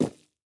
dirt, walking; factorio 2.0.55

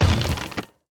car wood impact; factorio 2.0.55

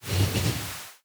train tie; factorio 2.0.55

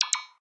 construction robot; factorio 2.0.55

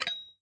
grenade inventory pickup; factorio 2.0.55

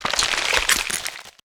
big gore; factorio 2.0.55

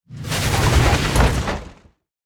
spidertron debris; factorio 2.0.55

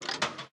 coin inventory move; factorio 2.0.55

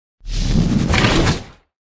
tank debris; factorio 2.0.55

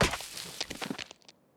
tree leaves; factorio 2.0.55

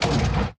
vehicle inventory pickup; factorio 2.0.55

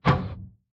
artillery inventory move; factorio 2.0.55

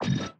module inventory move; factorio 2.0.55

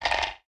low density inventory move; factorio 2.0.55

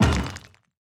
car stone impact; factorio 2.0.55

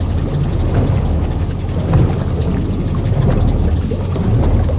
chemical plant; factorio 2.0.55

